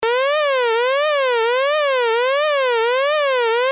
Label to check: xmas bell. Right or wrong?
Wrong